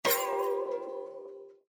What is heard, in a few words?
Windows Battery Critical; Windows 7 Sounds; Calligraphy